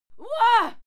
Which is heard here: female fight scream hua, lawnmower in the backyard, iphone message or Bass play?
female fight scream hua